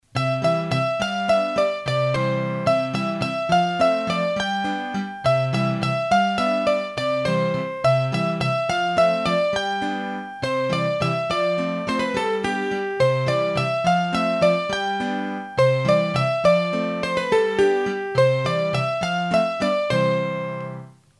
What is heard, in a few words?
La Mourolliado trad, hurdy gurdy, Bourees d'Auvergne; Massif Central